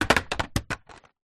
foley cup fast food empty squashed drop; zapsplat

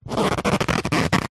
paper glossy hand rub squeak; foley

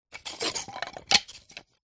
wood pieces small set down

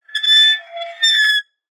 Creaks n Squeaks Knife Glass Squeak; soundbits

